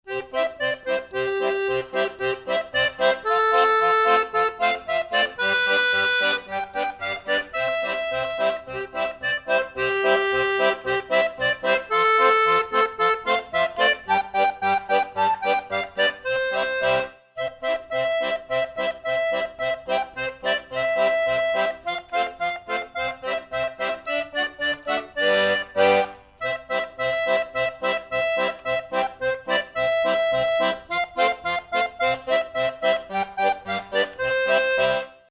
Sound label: jingle bells, accordion